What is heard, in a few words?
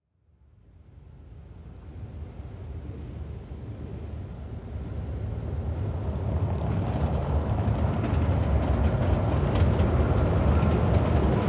bus arrival and departure